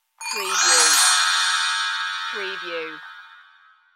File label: Chinese Bell Tree Gliss